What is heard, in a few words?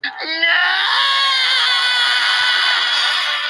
nooooooooooooooo; yertle the turtle